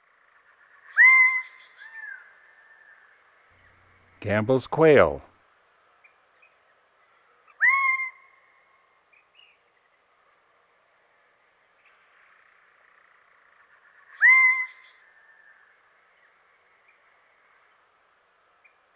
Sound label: Gambel's Quail; Western North America; Dan Gibson; Wildlife Identification By Sound